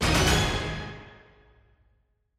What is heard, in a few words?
Quiz Question